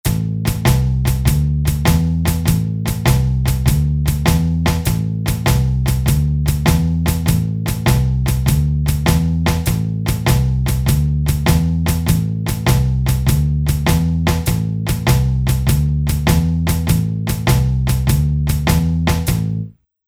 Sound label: Blues